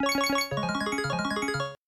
game complete effect

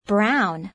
fire alarm

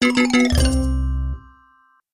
game lose effect

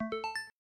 hint answer effect

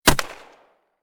bullet

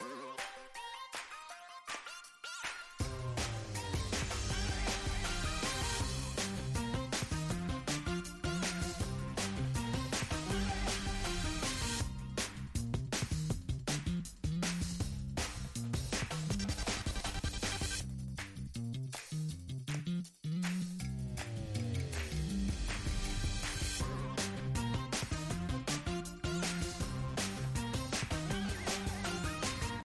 music cheerful; bgm

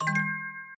notification pop up

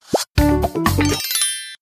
right answer effect

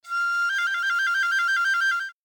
piccolo

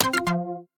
game tie

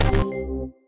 wrong answer

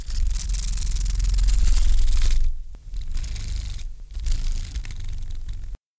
dragonfly

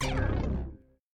wrong answer

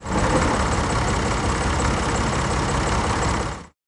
tractor

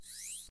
letter drag

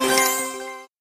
star shine

